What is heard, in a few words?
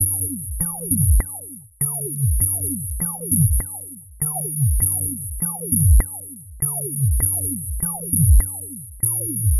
Combination Rhythm